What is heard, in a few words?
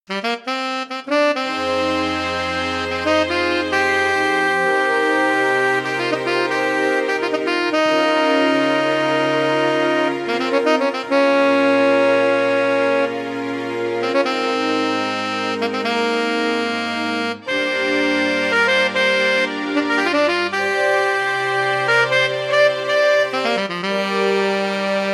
Saxophone